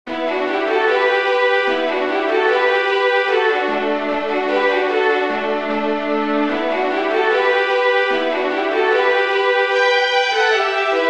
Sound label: REEL WITH HARMONY, fiddlers; PELICAN; Southern Kings Fiddlers; peifiddlers